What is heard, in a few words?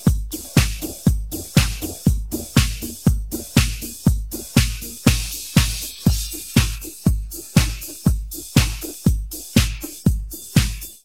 drum